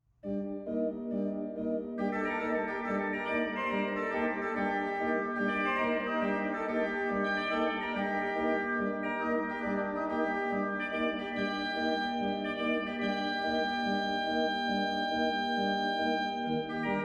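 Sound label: tarantella, organist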